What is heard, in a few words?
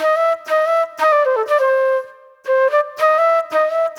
Soul Plate Flute; Sonic Foundry (Sony Creative Software); Manhattan Flutes; Session 03 - Classic Caddy